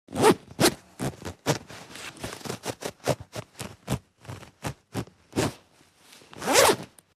Jacket Zipp Strugle, zippers

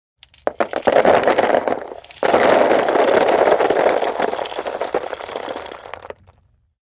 Rock Grav Pour Board, rock exterior

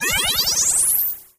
laser, lasers

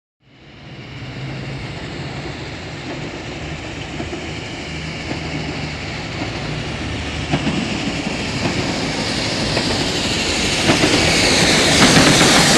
By Med Steam Train

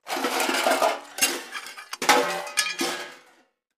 Junk Search Through J, junk crashes; Junk Search Through Junk Pile

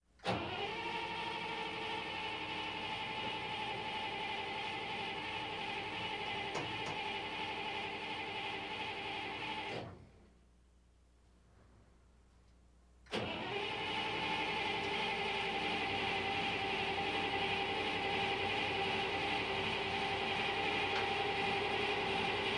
APC False Start Mono, armored personnel carrier

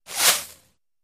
Arrow Sizzle Bys Ligh; bow & arrow bys

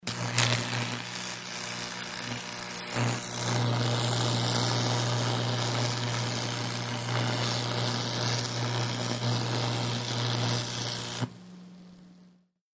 Weld Elect Arc Cut Cra, welding

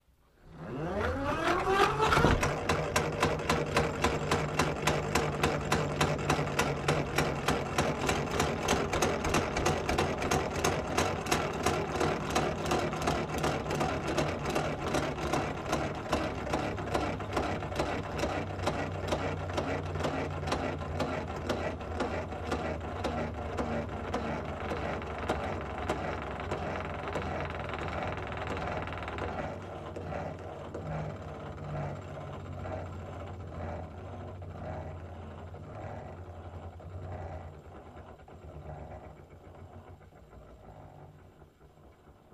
Grinder Hand Speed

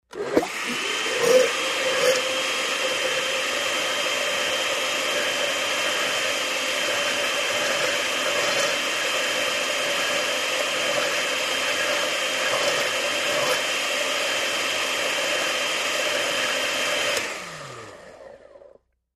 Blender On High Run Of

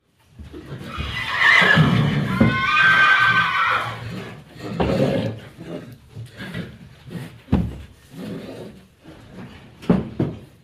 Horses Stall Irritat

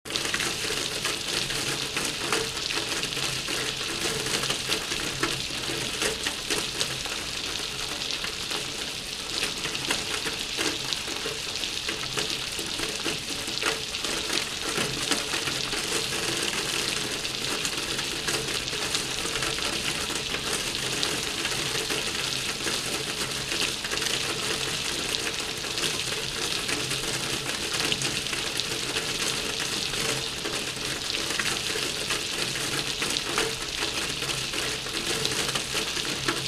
Rain; Medium Rain Off Roof Hitting Cement.

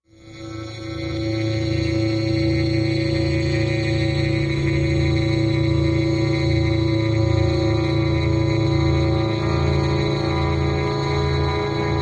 Rangefinder Machine A, energy beams

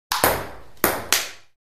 Ping Pong Serve Ball

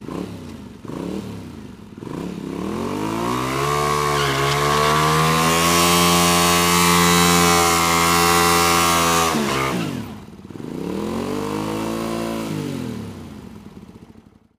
Motorcycles Honda Dr

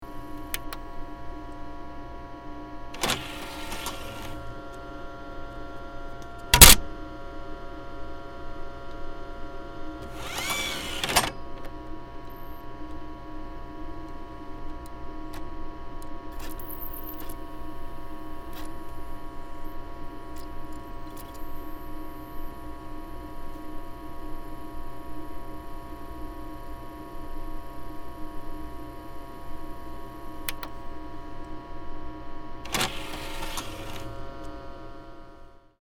Cd Rom Turn On Off, computers

